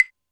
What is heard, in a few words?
Lo Clave, Alesis D4 drum machine